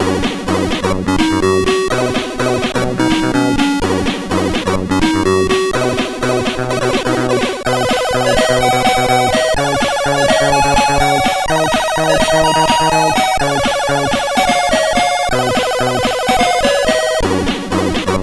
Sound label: Music, CSG8580R5; hvsc